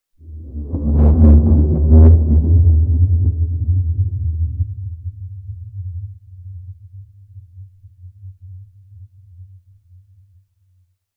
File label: a menacing womp womp sound